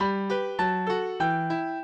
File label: minuet, mozart